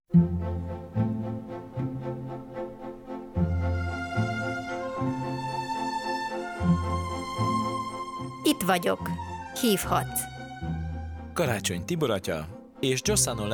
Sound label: Jingle rovid zenevel; Itt vagyok Hivhatsz